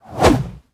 Sword Thick Underlay Attack

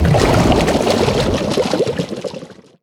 Death

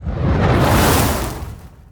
Flamecaller Attack Underlay

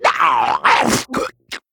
Male Murloc Death

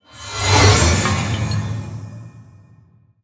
Geode Attack

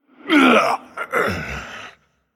Death

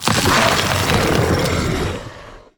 Saronite Shambler Death

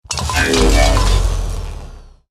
Death; Omega Buster

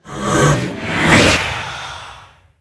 Amgam Rager Play

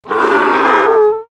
Exporters Pack Mule Death

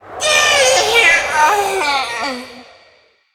Snowman Death

